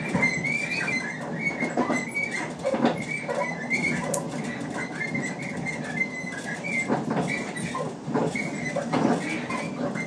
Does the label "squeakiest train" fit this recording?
Yes